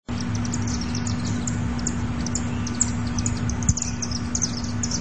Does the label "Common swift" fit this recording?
Yes